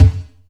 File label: Strange Kicks, Percussion; Dance Synths